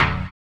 HOUSE, House Dance

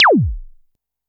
korg trident

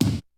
KICK SNARE; Kid Nepro; Elite; KICKS SNARES

